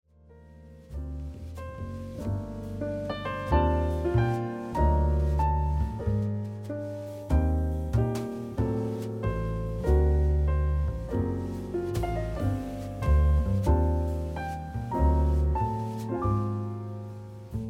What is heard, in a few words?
Jazz dry; Savant Audio QRS